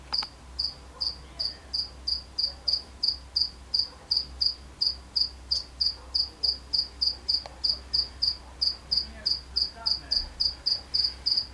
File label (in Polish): swierszcz domowy; ZG Lesne; OrzechowskiR